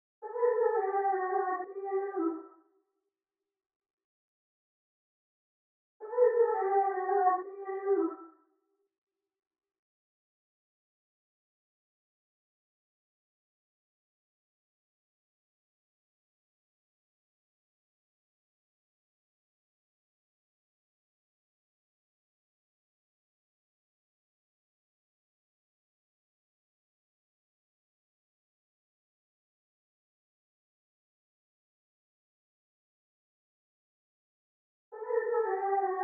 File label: 166 BPM E Min Vocals; Cymatics; 2022 Melody Collection - Reverse; In The Clouds